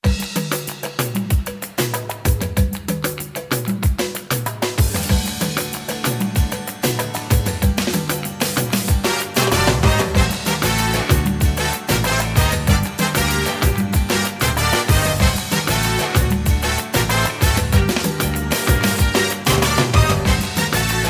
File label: LATINO FIESTA, BULGARIAN